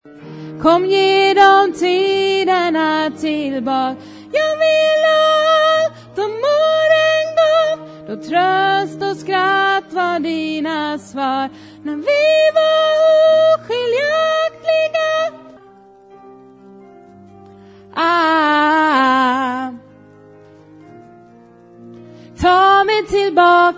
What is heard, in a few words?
tenor